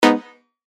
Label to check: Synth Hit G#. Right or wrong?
Right